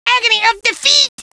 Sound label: Agony; Agony of da feet!; Gruntz; Spikez; Reaction to pain